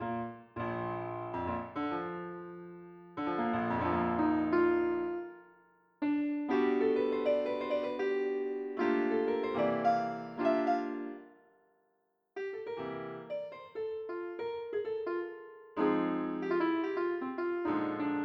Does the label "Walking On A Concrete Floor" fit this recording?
No